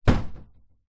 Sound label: household door internal wooden modern close other side bedroom room environment